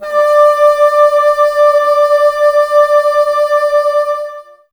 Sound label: Bassoons D 5; Miroslav Vitous - Woodwind Brass Ensemble